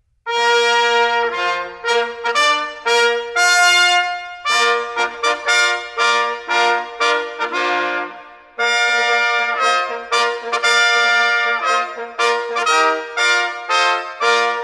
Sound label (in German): fanfarenblaeser